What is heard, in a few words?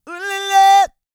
SING, World Voices, CARIBBEAN